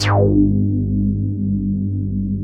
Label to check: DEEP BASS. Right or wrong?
Right